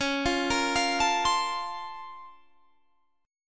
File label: Bb Minor Major 9th Db